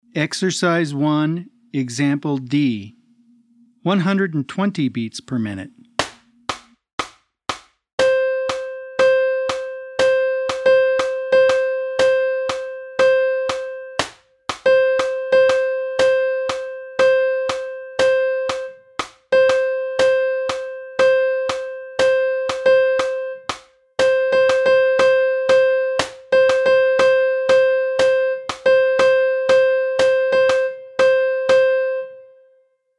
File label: 120 BPM